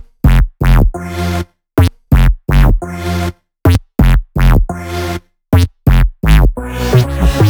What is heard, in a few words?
128BPM Melody; Vengeance Samples; VFH1 128BPM Pills Kit